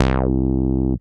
303 Bass, Old Skool House; Big Fish Audio; OSH Bass

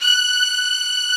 HI ST STRING

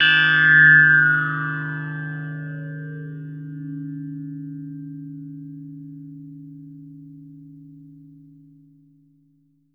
Ambient Tone; Misc

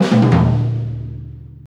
Diga Da Dum 140, Lounge Lo Fi Break Fillz

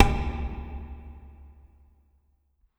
WD R, DRM HITS; Spectrasonics; Liquid Grooves; WAVDRM HITS1

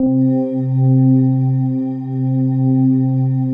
PAD, DIGI-PADS

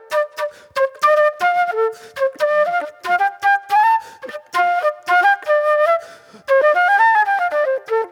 Old Soul Flute

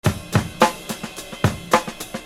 Beat, Hip-Hop Street Beats

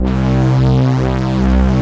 OB STRINGS; Pro-Rec - Synthsonic; JV ANALOG2

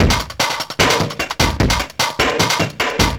Beat, Industrial

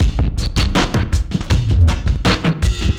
Dirge Monster Beat, Drum N Bass; Sonic Foundry (Sony Creative Software); Knutrix Drum & Bass

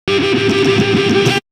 Pluck; AMG

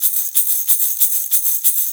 6-8 Metal Balls, Perc Loops

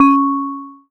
C#, BELL GONG, Synclav FM; Big Fish Audio

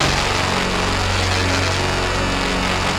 basses; Wizoo - Powered Wave

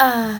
vocal shot a; MOLECULE

